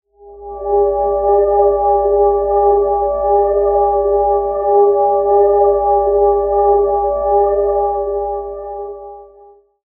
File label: light, cantrips